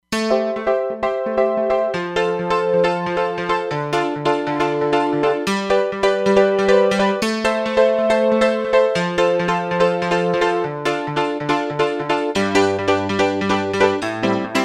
Dance Pno, Roland Fantom XR

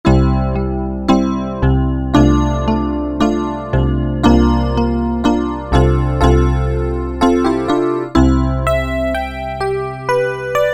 E. Piano, Roland W-30; Factory Performance Patches

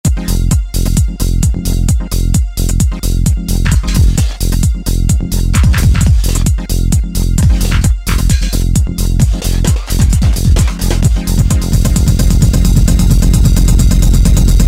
Underground, Yamaha DJX-IIB

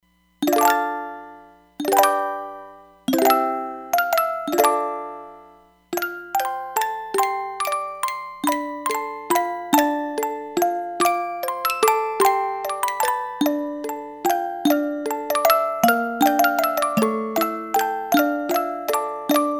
Music Box, Roland Fantom XR

